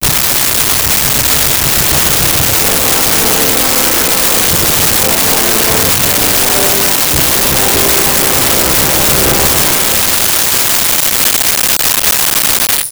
winner

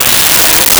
Raspberry, Comedy Vocals; Vintage Cartoon; SONY PICTURES SOUND EFFECTS SERIES

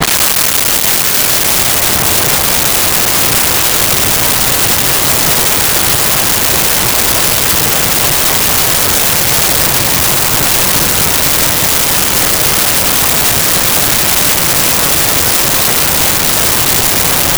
Gravel Pit; Backgrounds; 1001 Sound Effects

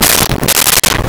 pprrfttt; Rosegarden; soundFX

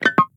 pep, notifications; Nothing OS 1.5

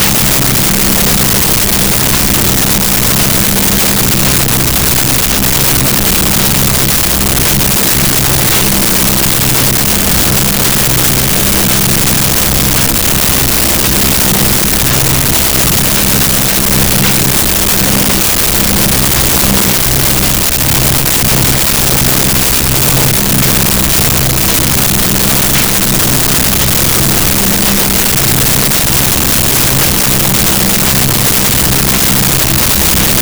tension; Rosegarden

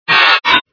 yay, Crowd

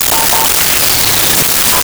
starter; Sound Effects Library (28Cds)

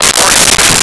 Sniper, Gun shots

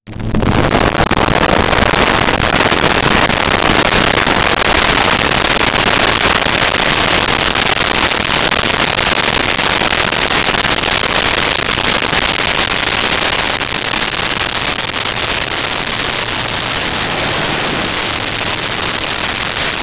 18 Wheeler By 55MPH; Vehicles; Cars & Trucks; SONY PICTURES SOUND EFFECTS SERIES

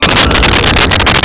sfx pod ani shift

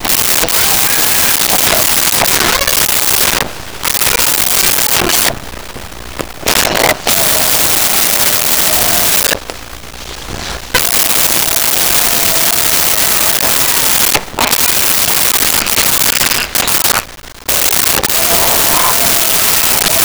Crying Small Child; Vocals & Wallas; SONY PICTURES SOUND EFFECTS SERIES

